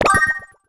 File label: SFX - Buy Item; uno